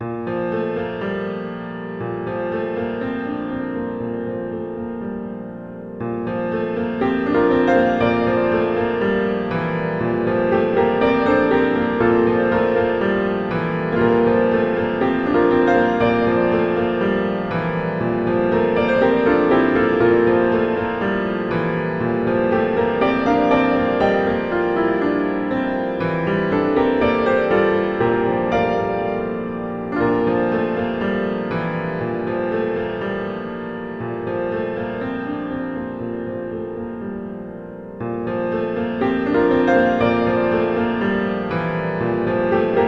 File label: piano arrangement